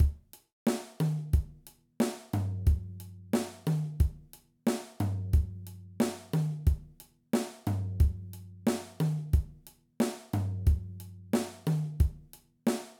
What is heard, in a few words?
Groove, drum